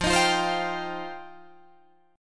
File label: F 7th Suspended 2nd F down Guitar Drop D